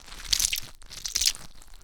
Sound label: Slime